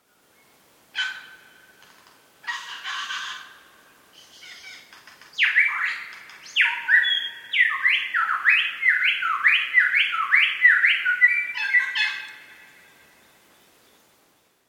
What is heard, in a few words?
gang gang